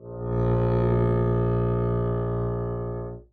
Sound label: arco bb1 f down, double bass; dsmolken